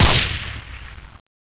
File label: silenced, weapons